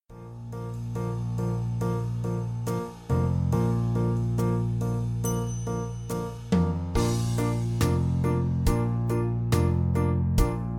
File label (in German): Froehliche Weihnacht fuer 1-2 Alt Saxophone